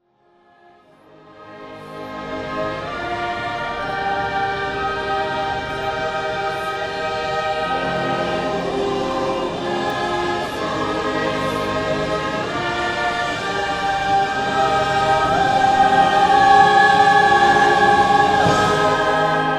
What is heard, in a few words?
chorus band, Live Music Recording